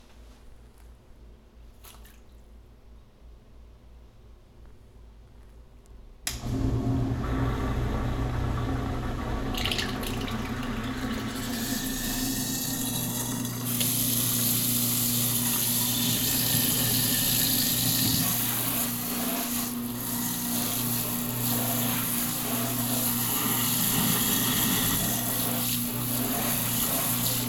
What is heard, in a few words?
Garborator, Sink, binaural